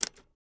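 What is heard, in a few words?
Typewriter Single Key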